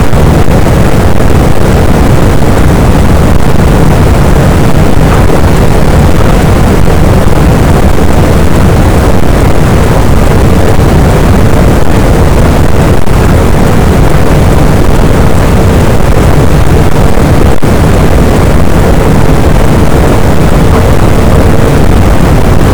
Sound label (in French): cachalot, boat; Physeter Macrocephalus